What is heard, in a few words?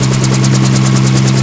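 helicopter news engine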